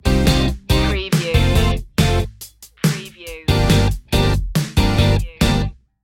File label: full city stinger